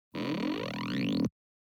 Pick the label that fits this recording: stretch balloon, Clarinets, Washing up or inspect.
stretch balloon